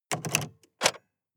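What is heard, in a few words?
sports car interior key pull out; verkehr transport; autos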